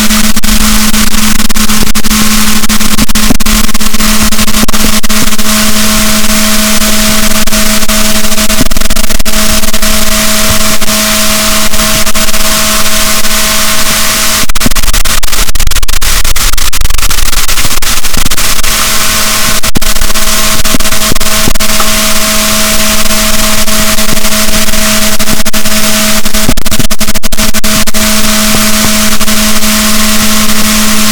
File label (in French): rorquals, boat, Balaenoptera Physalus; SNR 6dB